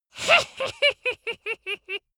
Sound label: dwarf laugh sneaky